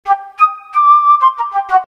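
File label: flute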